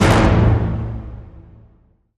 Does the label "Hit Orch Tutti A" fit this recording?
Yes